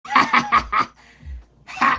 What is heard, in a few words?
gremlin laugh